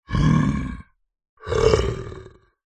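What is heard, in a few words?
animal wild could be bear growl snarl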